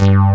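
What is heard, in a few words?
synth bass, JV 1080; Pro-Rec - Synthsonic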